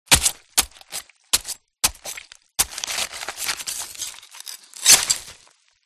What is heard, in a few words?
Knife Flesh Multiple; audio hero